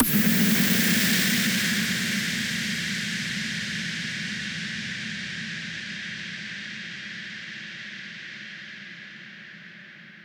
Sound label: IMPACT shreddage; IMPACT soundworks